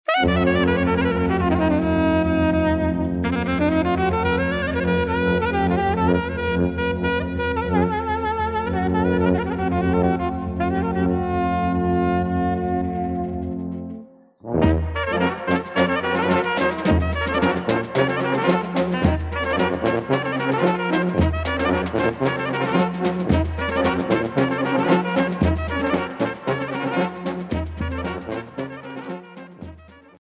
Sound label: Romski cocek